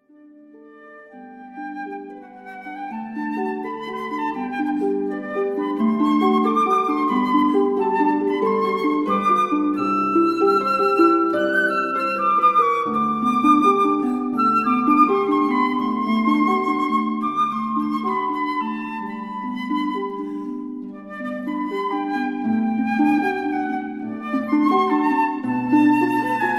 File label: Sonata g Larghetto